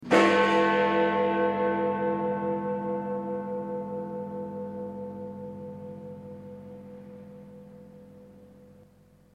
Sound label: big ben one gong single chime